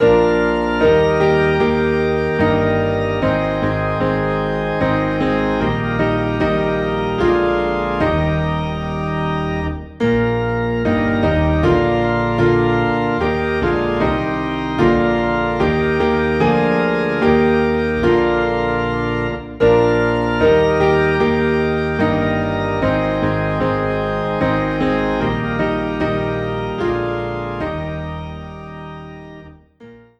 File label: Piano and Organ Low in E Flat